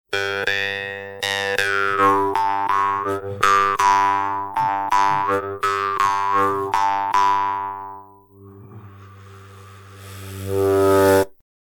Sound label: Khomus